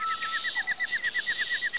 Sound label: collide